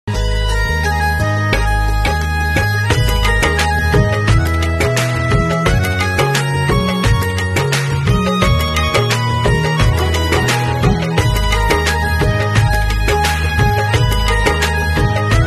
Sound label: INSTRUMENTAL RINGTONE